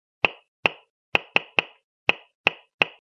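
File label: knocking sound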